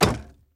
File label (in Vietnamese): Tieng mui ten ban trung muc tieu bang go